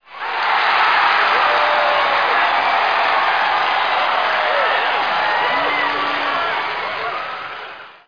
clap, stadium, hockey

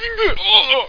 oooww; quake

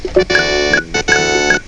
puzzler right; The Learning Company

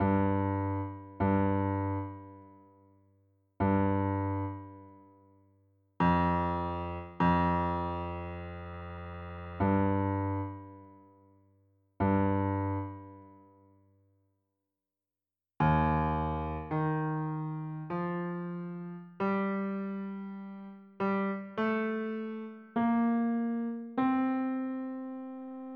ent march; Extended MIDI

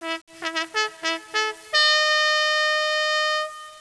horn

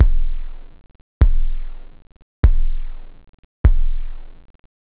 stomper, drums techno; OctaMED SoundStudio V1

